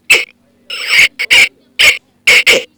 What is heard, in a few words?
kiss